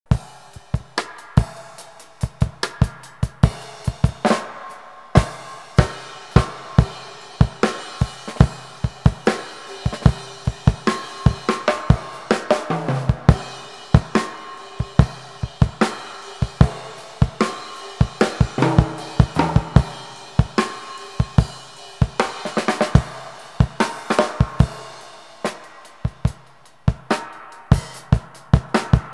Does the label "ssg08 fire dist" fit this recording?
No